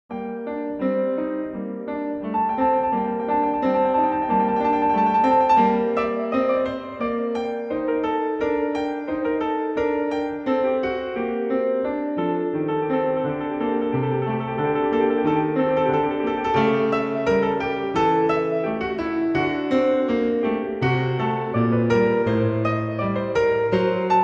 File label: Prelude in G Minor; Bach; BWV861A